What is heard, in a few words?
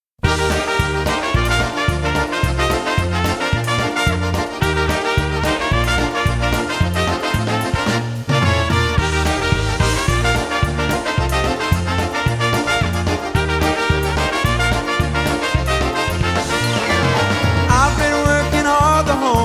I Wanna Polka Dance Polka